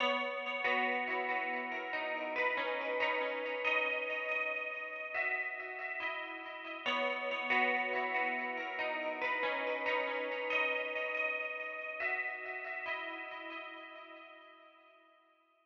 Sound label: GUITAR CLEAN; KONTAKT LIBRARY